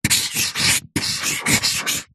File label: office marker pen highlighter luminous draw diagram on paper